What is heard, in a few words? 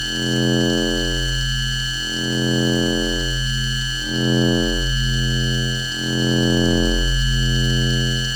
computer terminal loop; Ambience; Props; Warframe; TheNewWar; Zariman